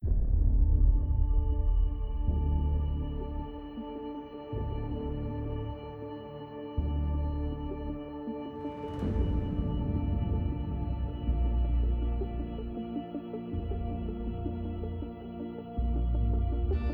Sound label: Ambient Music Suite; Pharaoh Ambient Music Suite (Bonus Track); Total War: Pharaoh Original Soundtrack Deluxe Edition